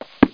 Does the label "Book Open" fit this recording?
Yes